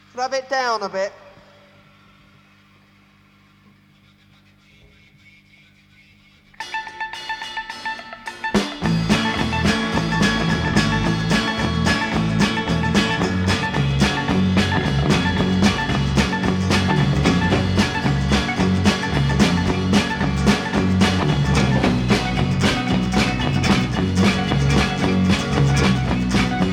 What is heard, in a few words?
Instrumental